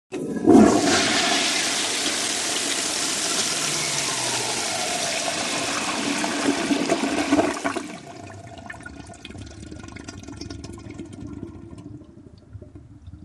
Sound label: HOUSEHOLD TOILET: INT: Modern low tank toilet flush.